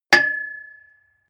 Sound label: metal impact pole high hard; hits explosionen; metall